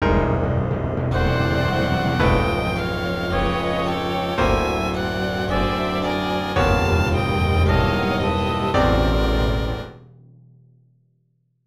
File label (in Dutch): piano concert deel